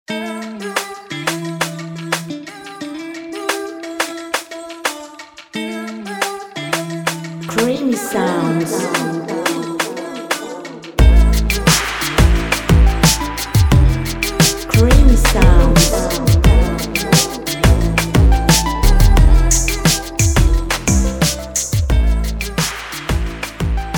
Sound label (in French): creation musicale urbain hiphop